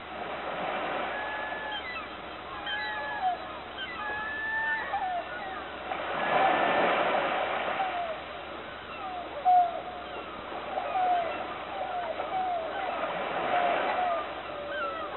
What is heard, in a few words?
ocean; Rumbles